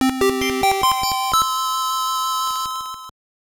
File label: Jingle; Xena Warrior Princess; GBC